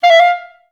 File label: F4, Advanced Orchestra; Best Service